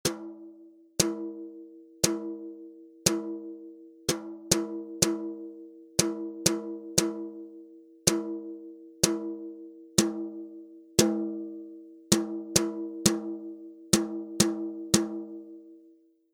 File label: RHYTHM; traditional notation